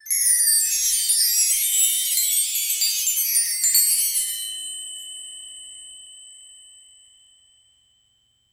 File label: distant twinkling chime s, sparkle